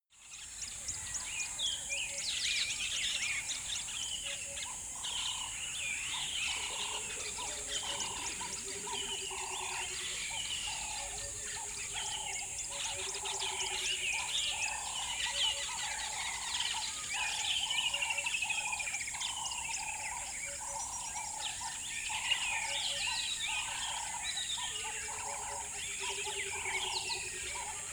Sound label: river bed dawn chirp soft